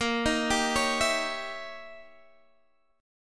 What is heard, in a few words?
D sharp 7th Flat 9th, A sharp